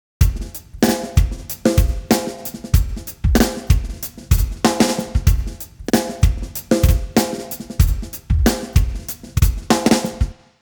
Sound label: drums 110; timestretch